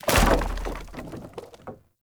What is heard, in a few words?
Wood Boarded Wall Small Destroy; Objects; Destructible Barriers